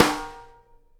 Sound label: snare GLASS, Live Snares